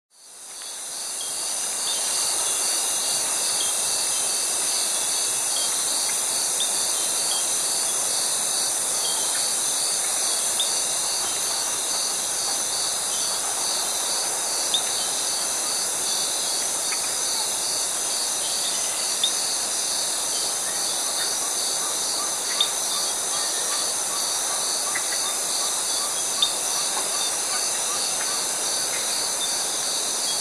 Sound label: Tropical Forest with cicada and cockatoos